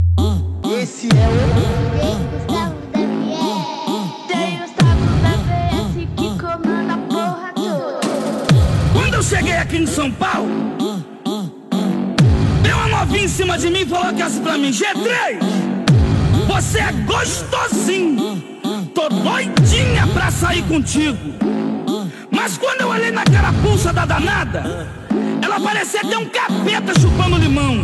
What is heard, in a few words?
funk bass